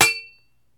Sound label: Metal Clang